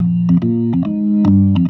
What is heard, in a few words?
B3 LOOP A#, PLAYED